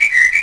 birds; Jump Zampoli

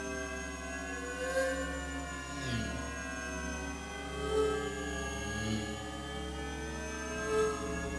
eerie

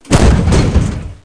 105mm Cannon; Close Combat; CC Sounds

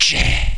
MAGE

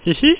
laugh

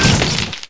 crash; Redline

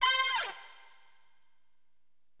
STAB; HipHop Fx; LiveAct Pool 1

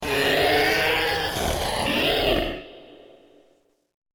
monster; the project

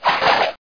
staff twirl; Heretic II demo; weapons

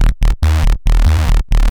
Bass; Techno Music Maker

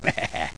HAHAHA

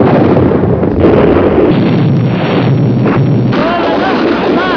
American Attack; Patton Strikes Back; Patton Demo

